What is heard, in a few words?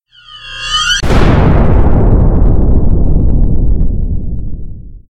battle announce; Monster Arena